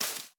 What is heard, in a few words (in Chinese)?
樱花 树叶 破坏声